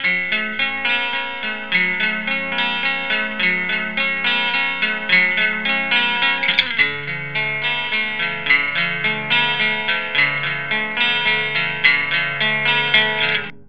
out IR reverb room; Fourier Transform; MATLAB

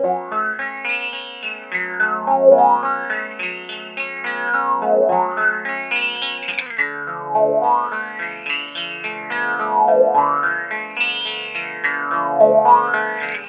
wah, Digital Audio FX

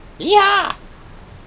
impulse; Fourier Transform

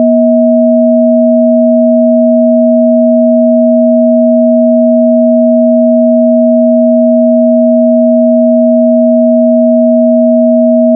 two sine ringmod; Digital Audio FX

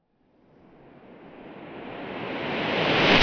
impulse rev cathedral; Fourier Transform; MATLAB

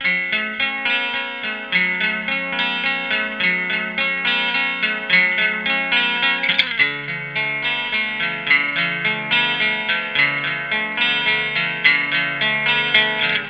IR reverb rev room; Fourier Transform

